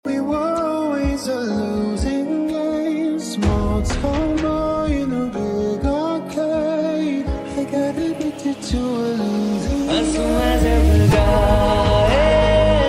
arcade ringtone; english ringtones

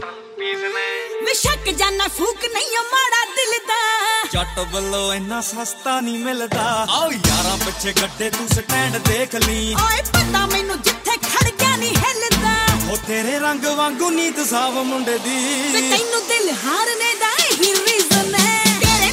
bollywood music ringtone

